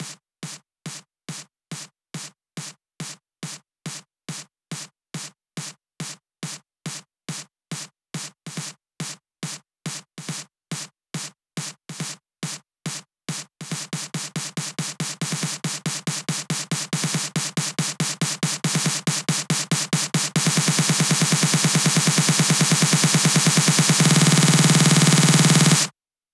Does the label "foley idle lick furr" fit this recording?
No